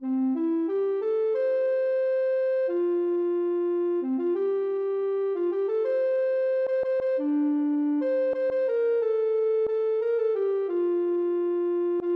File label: ocarina